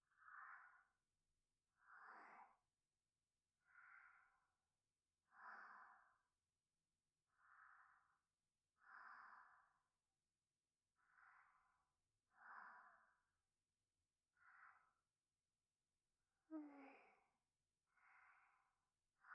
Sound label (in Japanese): 添い寝 寝息 ループ音源; 和み処 一番星～距離感の近い甘えん坊な仲居『月さん』が一生懸命ご奉仕する癒しの 一日～; 月の吐息&寝息 ループ音源